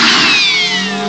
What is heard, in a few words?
SWORD LS; STARS FXS; East West; Global Sounds